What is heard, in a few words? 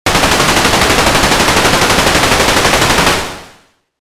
machinegun; 攻撃